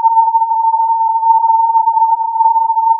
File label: filtered input